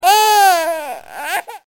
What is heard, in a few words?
baby cry